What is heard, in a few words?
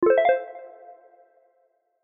notify